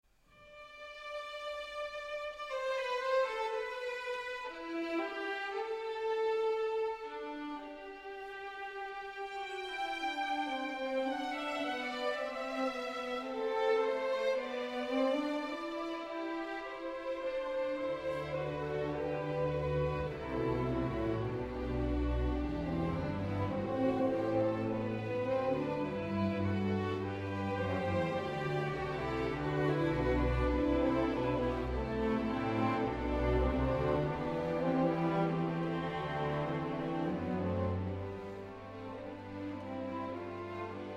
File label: andante sostenuto